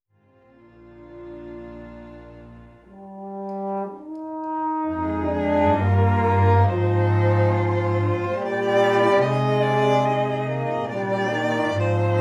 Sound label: Tribute Horn and Strings